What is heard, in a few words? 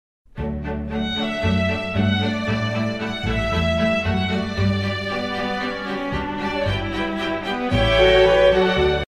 string